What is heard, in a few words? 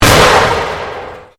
Convolution Pulse